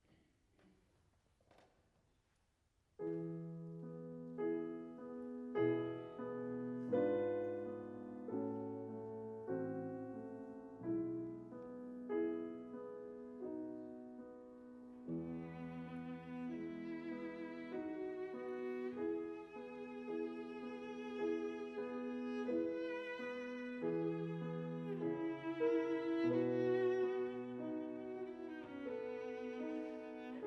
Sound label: arpeggione